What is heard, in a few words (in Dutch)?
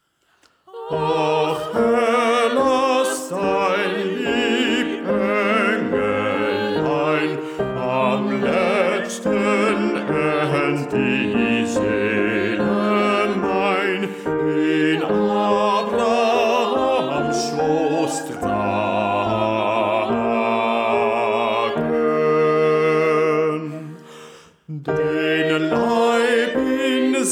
bas; ach herr